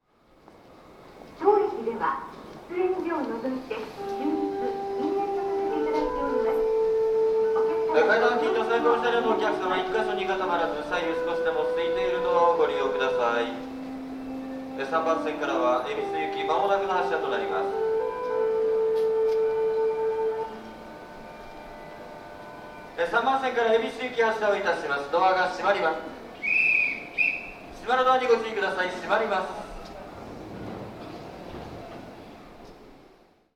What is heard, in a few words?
ikebukuro melody; jreast; saikyo